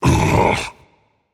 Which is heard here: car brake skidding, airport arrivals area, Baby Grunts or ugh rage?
ugh rage